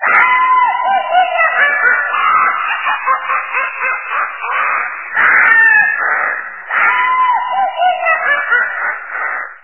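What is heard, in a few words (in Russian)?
ведьма смех долгий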